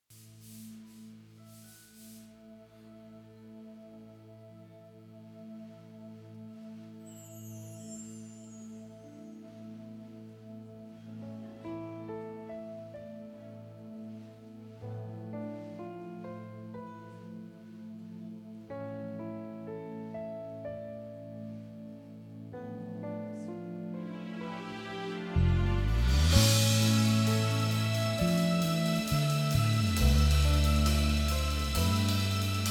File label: instrumental